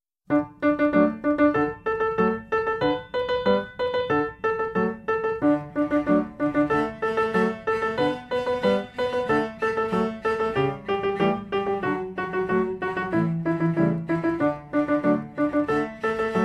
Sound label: Twinkle Twinkle Band